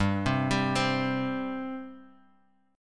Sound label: C 5th G